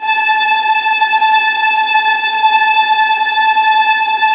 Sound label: VIOLIN, Synclavier Strings; ILIO